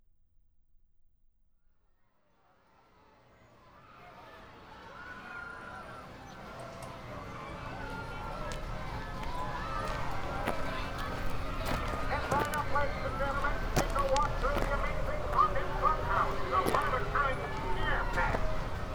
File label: SFX Haunted Fun House; Scary Music